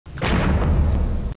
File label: rock door